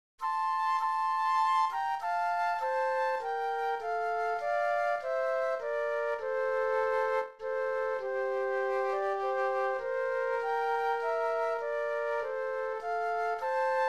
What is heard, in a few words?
Flute Duet; christmas